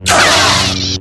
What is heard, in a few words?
big hit, lightsaber sfx